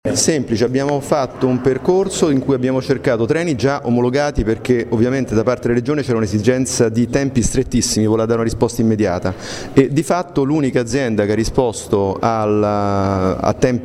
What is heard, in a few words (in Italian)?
Soprano